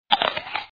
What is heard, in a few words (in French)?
croque promme